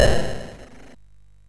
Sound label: sid MOS6581R2